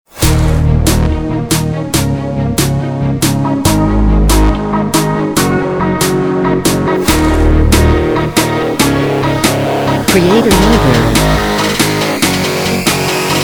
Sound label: Workout Trap, Hip-Hop music